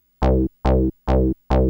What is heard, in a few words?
Bas lon; Techno Music Maker; Bass